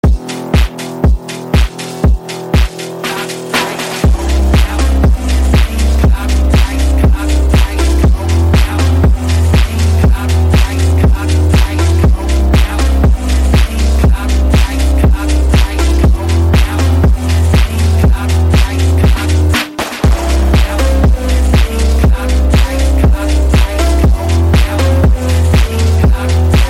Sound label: phonk warning